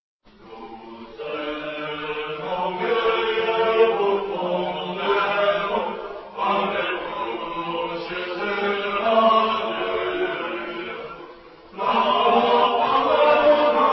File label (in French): chant russe